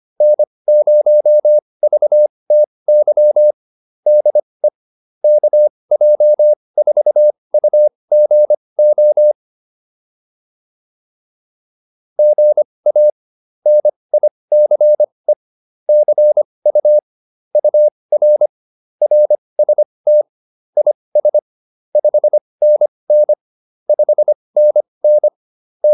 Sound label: QSO, advanced practice files